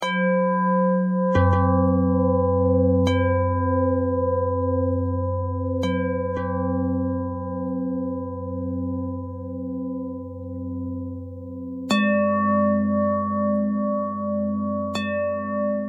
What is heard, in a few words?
bell threads; bells